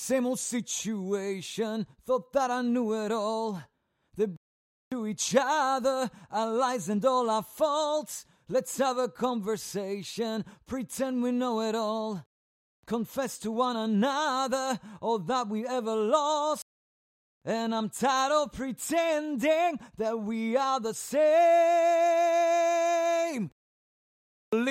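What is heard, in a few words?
rock, 110, A minor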